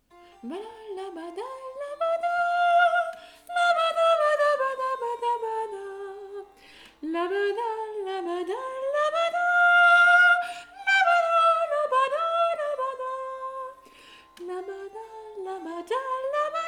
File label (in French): Gavotte Sop